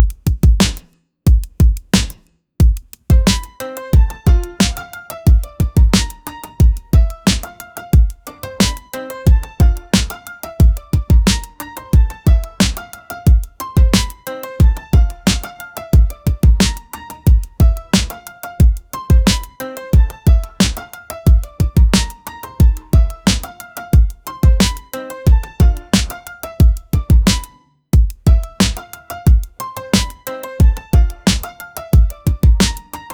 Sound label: Boom Bap 90s Beat